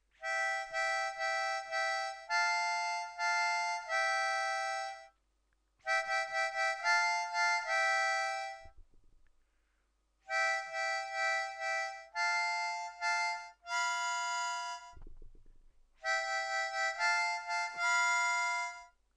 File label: Verse Fill